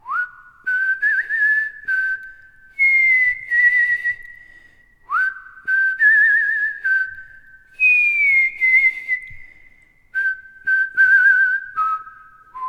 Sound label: Happy Birthday Whistled